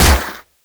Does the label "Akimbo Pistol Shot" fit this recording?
Yes